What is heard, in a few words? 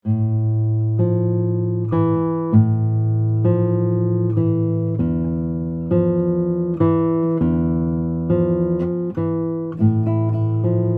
tango, guitar